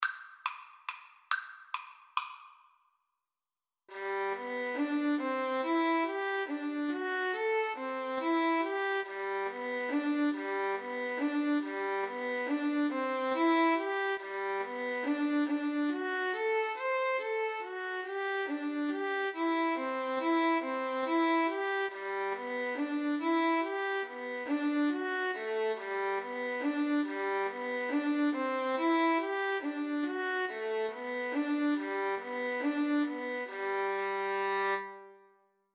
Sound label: violin duet